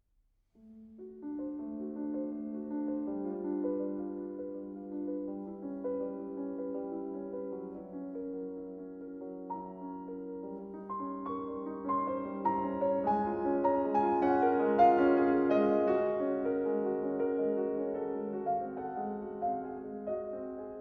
Piano Sonata in B flat minor

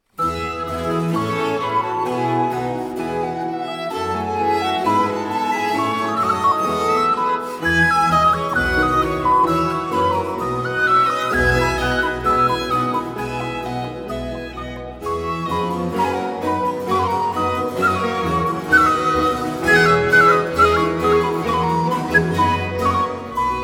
Recorder Concerto in F Major

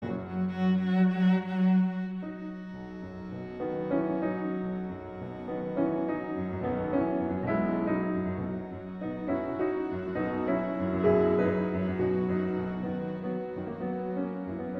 Piano Trio in B Major; Trio Wanderer; Brahms; No 1

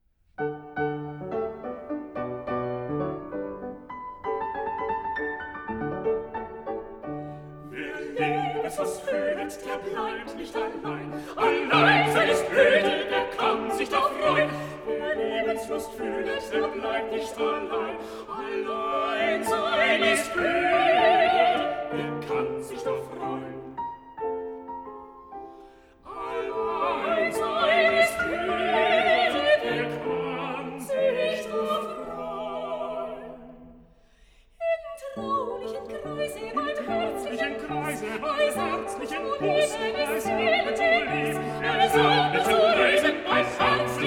Lieder Vocal Quartets; Schubert

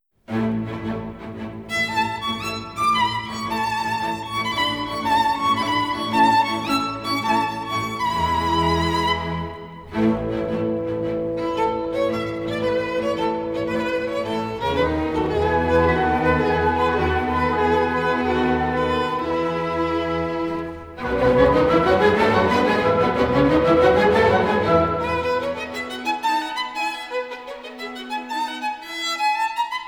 violin concerto in A major

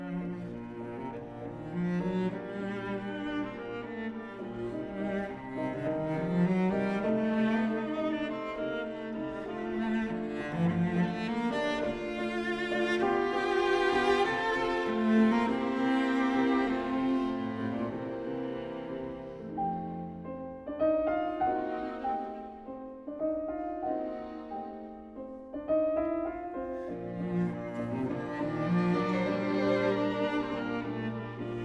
Piano Quintet; Schumann; Piano Quintet Op 44; Piano Quartet Op 47; Piano Quintet in E Fl

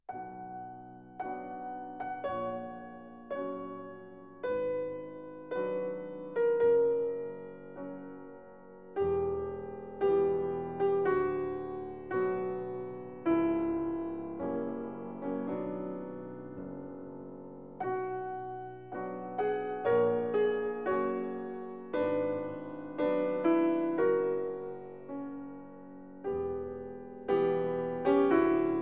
Prelude

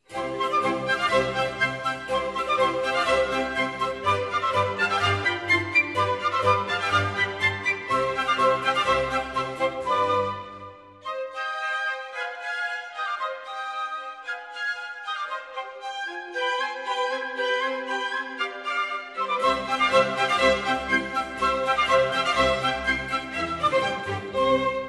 Concerto for 2 piccolos in C major

